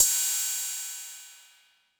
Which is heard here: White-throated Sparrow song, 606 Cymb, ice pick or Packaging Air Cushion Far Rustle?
606 Cymb